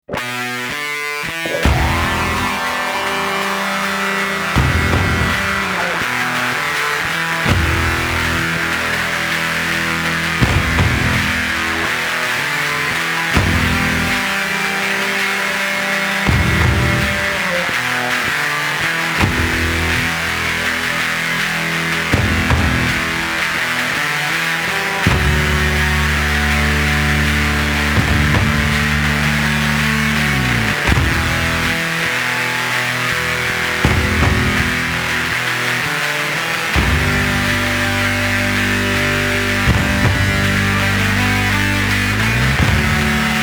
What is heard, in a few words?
BASSE